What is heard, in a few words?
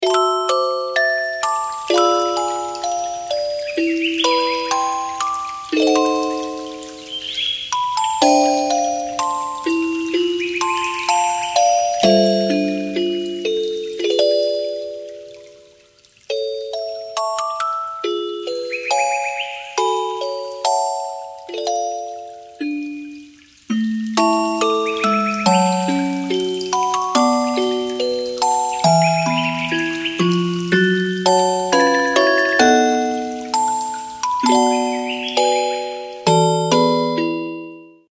Music Voice of the Nature; LG Vortex VS660; alarms